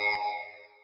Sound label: drag error